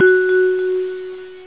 glocke

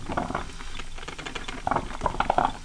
kaffee

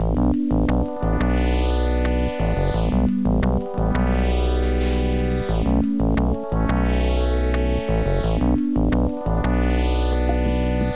MUSI

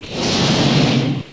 Geister Bahn Ein

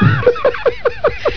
lachen; winopoly

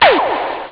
zisch, whoosh; da capo vol1; instruments

